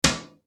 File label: Toilet Lid